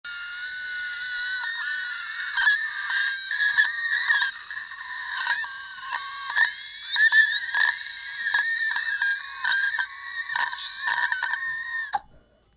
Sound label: tiger thrush